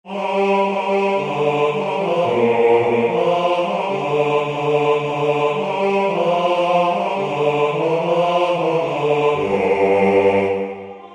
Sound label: bass; gaudete